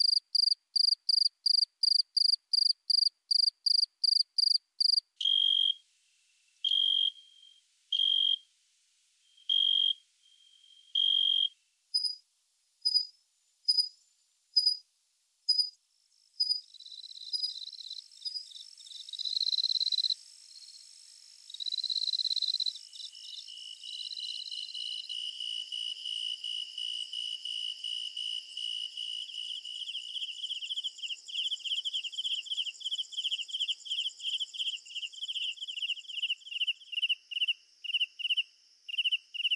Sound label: Crickets and Cicadas; Just Sound Effects